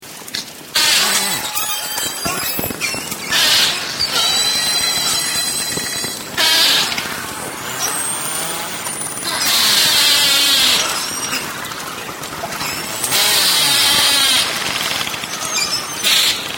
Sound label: beluga